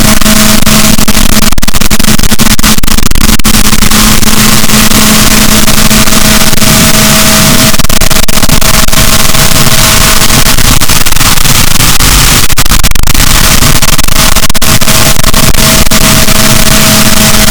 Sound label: rorquals, Balaenoptera Physalus, boat